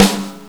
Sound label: Clap